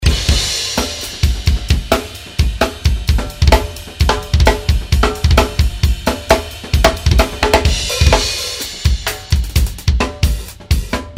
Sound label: Kit with snare drum; made by Trick drums